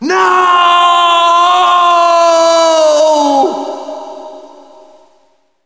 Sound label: fall; max payne